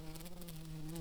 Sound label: Lapidarius queens, bumblebees